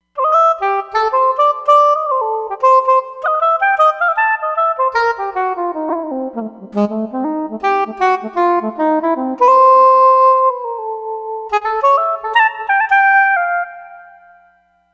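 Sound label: Solo Soprano Sax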